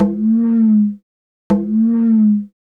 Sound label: Conga, Latin Percussion